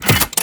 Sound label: Briefcase Launcher Reload Passive A, Weapons; Warframe; Corpus